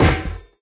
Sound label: physics turret impact sm